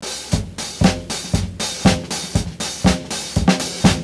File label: cymbal loop